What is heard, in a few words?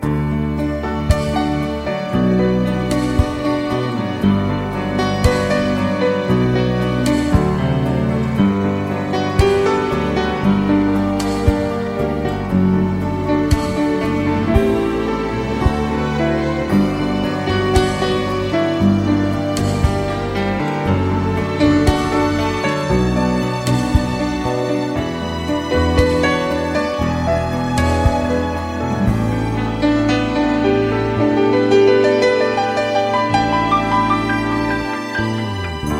Instrumental Ringtone for Mobile